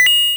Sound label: coin coin; panicpumpkin